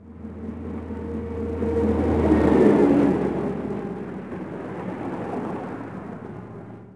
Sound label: freeway; Splashdown; XBOX; Soultree; Mission